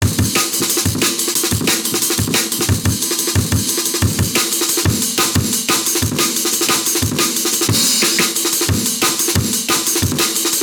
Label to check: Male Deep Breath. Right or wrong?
Wrong